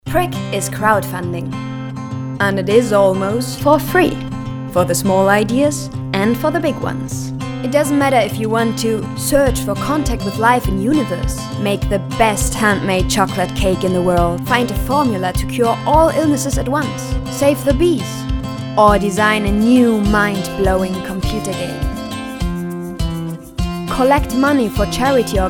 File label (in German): WERBUNG; PRIKK; SYNCHRON